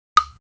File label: Perc; Coldcut's Kleptomania VOL1